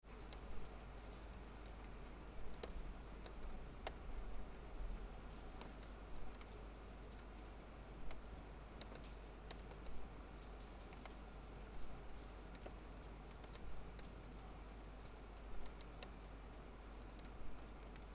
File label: Rain out of window thunder; atmosphere